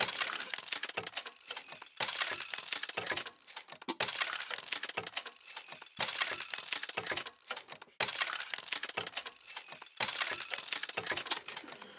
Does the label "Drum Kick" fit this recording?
No